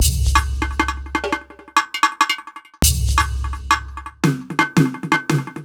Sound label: Mixed Percussion, percussion loops; Drum n Bass; KJ Sawka; Drum n Bass & Beyond; Drum Tool Box